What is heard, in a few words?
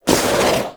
Speargun Throw Windup Foley; Warframe; Weapons